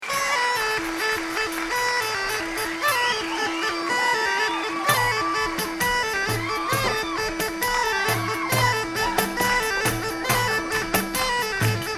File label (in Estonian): Vene tantsud folk; CD Kes aias; Vagilased